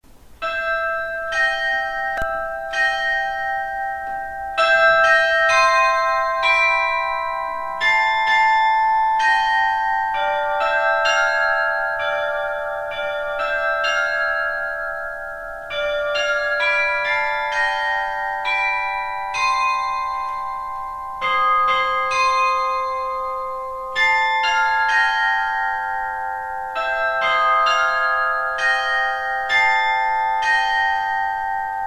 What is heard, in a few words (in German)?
8 Glocken Grassmayr Glockenspiel